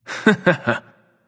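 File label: nonverbal heh heh; smite; voicepack; merlin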